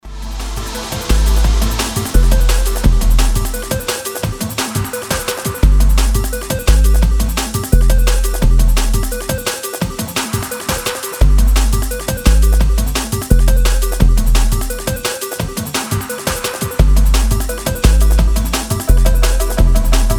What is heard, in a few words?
nice vibe